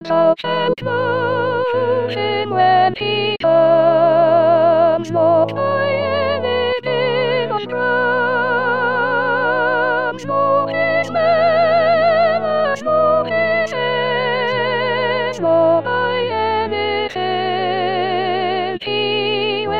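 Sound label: soprano 1; Sirett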